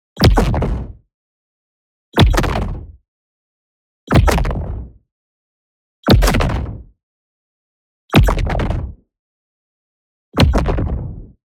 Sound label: EXPLOSION LARGE Ear Deafen; boom library; Matter